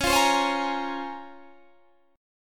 Db Major 13th, Guitar Standard; strummed; JGuitar

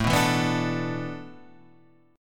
A Suspended 2nd Suspended 4th, Guitar, Standard; Asus2sus4 strummed; JGuitar

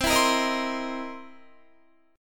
C 7th Sharp 9th C Guitar; strummed; JGuitar

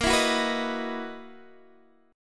Gb 13th Bb, guitar

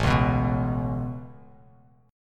A Major Add 9th A Bass Standard, chord; Aadd9 strummed; JGuitar

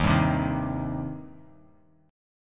Eb 6th Eb down; Eb6 strummed; JGuitar

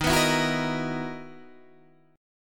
B Major 11th D sharp, guitar

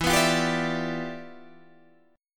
E Minor 7th Flat 9th E

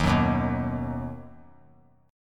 Eb Minor Sharp 5th; Ebm#5 strummed; JGuitar

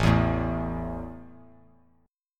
A 5th A down, chord; strummed

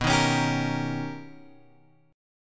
B Augmented Major 9th B Guitar Open F, chord; B+M9; strummed; 11,10,13,14,13,x; JGuitar

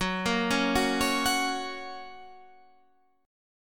F sharp Major, Guitar, Standard; arpeggiated; JGuitar

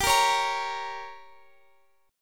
G Diminished, Guitar, Open F; Gdim strummed; x,x,19,17,16,17

